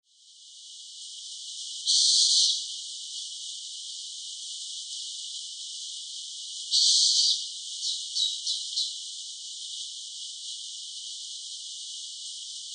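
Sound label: Japanese Scops Owl; towada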